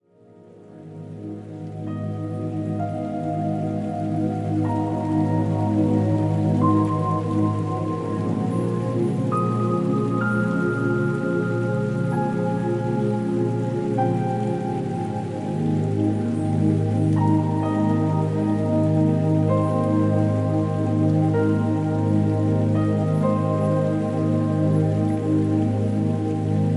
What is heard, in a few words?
Relaxing Spa Music Meditation Healing Stress Relief Sleep Music Yoga Sleep Zen Spa